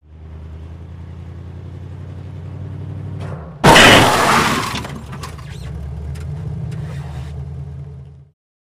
Drop Impact Moving Vehicle Collides With Stationary Vehicle, Centered, Scraping Metal And Tire Squeak